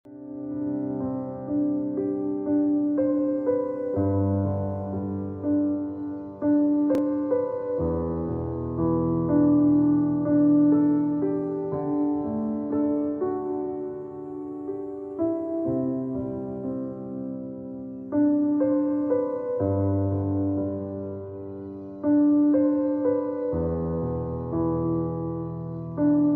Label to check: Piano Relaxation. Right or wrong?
Right